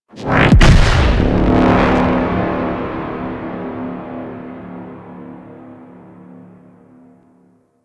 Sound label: Dropship Warp Out Boom; Warframe; Venus